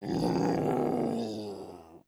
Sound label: zombie idle; zombies